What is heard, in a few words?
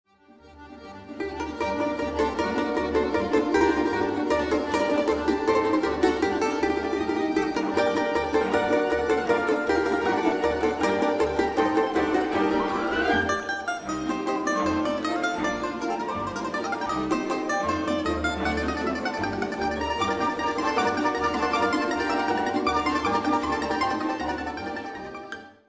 domra